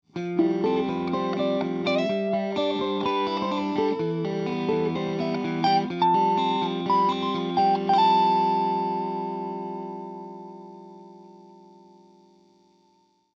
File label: Diamond Compressor Bass Bridge